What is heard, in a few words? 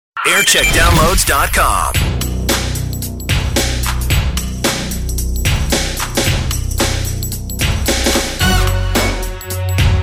Chart Show Bed; Radio 1; JAM